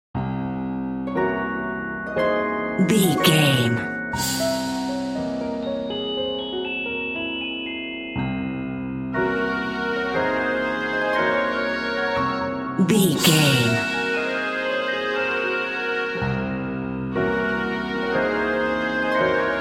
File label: trapped dream narrative